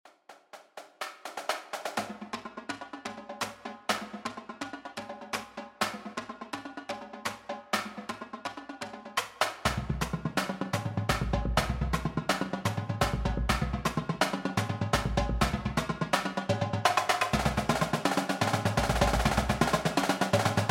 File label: Jig Full Drumline